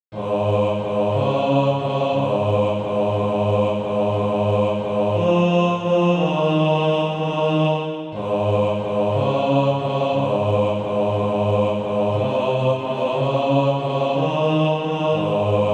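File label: bass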